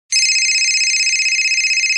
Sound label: Traditional Ring